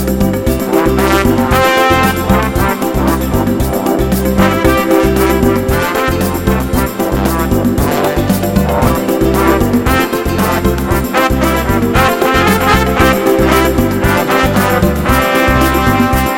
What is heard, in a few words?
Happy Music